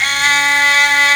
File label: HEAGUM A#2, KOREAN INST; AKAI S6000 CD-ROM - Volume 1